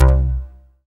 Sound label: Synth Bass; BA1 Synth-Basses; Sound & Vision - Gigapack I CD 2